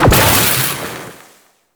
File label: Metronome End; Amalgam Moa; Abilities